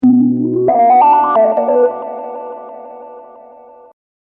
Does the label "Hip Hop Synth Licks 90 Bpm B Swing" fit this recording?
Yes